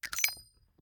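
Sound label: charge reload shell eject, pistol; gmod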